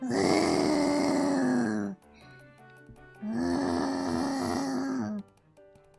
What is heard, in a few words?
a puppy groaning; korone; vtuber